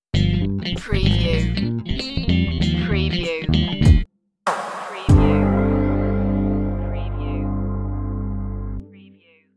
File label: smooth funky love stinger